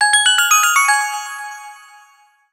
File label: Reminder; PlusFourSix; Venus; Lars Edvall; X1 Family 2